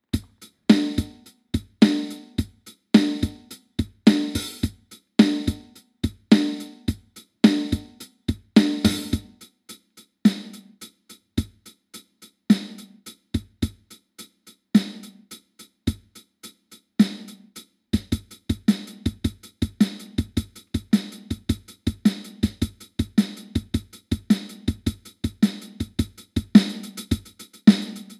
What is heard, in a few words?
Vox Mini Go Beats